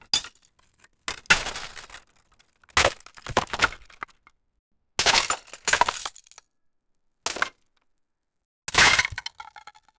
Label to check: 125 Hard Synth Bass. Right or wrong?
Wrong